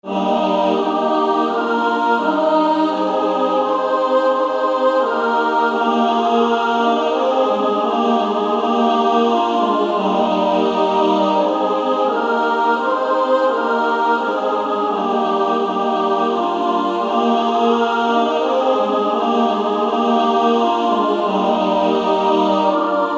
Female Choir SSAA

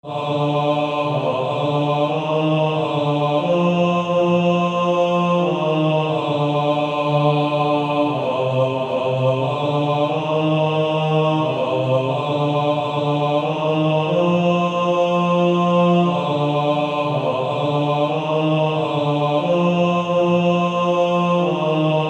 Male Choir

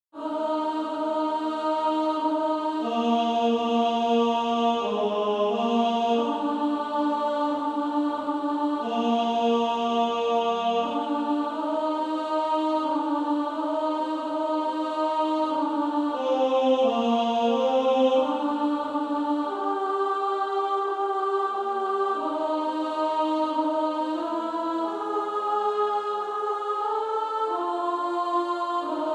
Female Choir

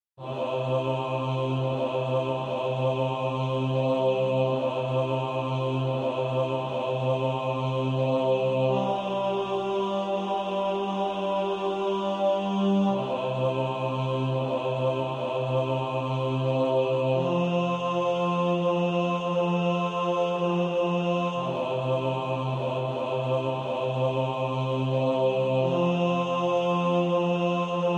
bas

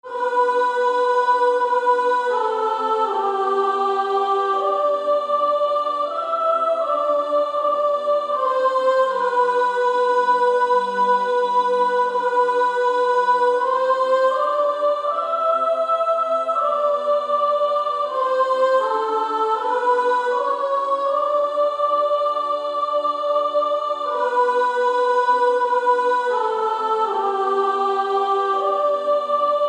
Female Choir S1; 1st Soprano; SSAA 4-part

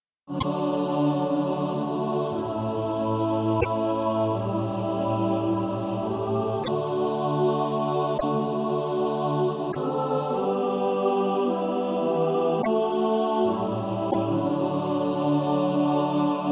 mixed

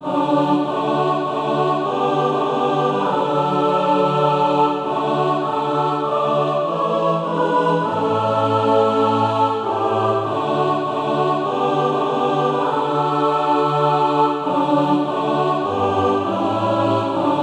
mixed; A Soldier of the Cross

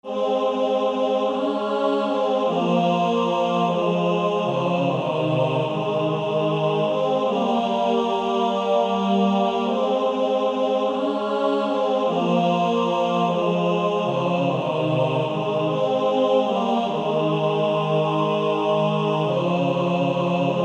Male Choir; Only a step to Jesus

